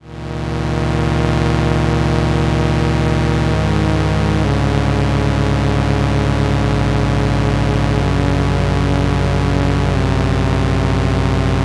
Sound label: chordizer